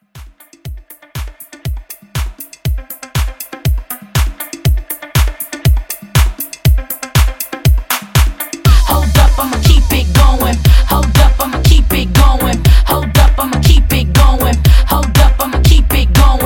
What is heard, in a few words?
Classic Hype Edit 120